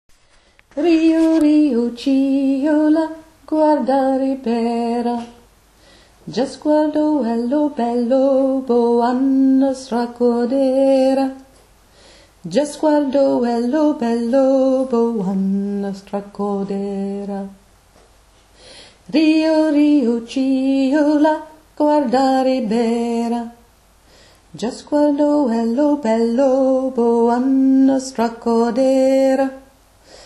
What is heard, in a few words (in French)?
riu riu voix alto version lente